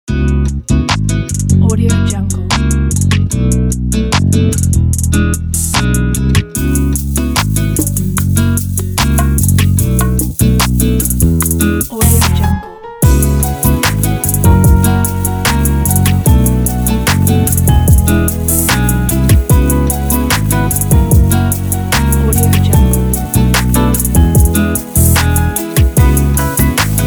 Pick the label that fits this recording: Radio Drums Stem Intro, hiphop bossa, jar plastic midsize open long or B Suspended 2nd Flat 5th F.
hiphop bossa